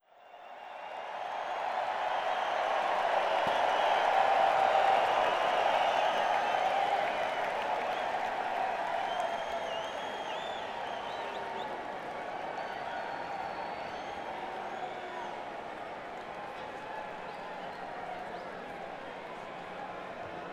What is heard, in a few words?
Crowd; Las Vegas, NV - MGM Grand Garden Arena